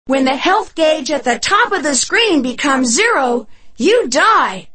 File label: health meter warning; omochao